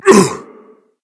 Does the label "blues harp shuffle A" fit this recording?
No